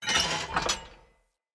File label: Light Mirror Move F; Puzzles